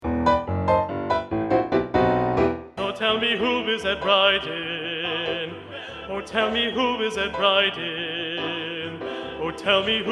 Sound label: Bass 2 Predominant, choir; advanced SATB honor choir; Practice Track